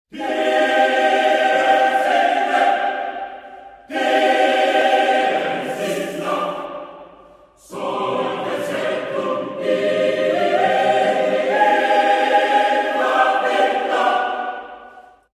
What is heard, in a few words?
horror choir theme; chikn nuggit; iscream